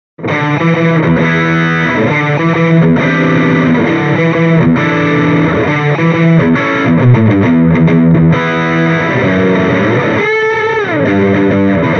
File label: Mini Rox Mic Inside CANNABIS REX Distortion Stratocaster